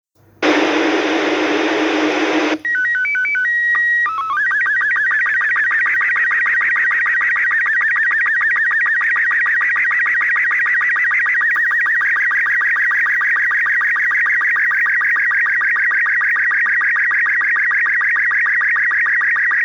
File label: ISS SSTV; April 11-16 humans in space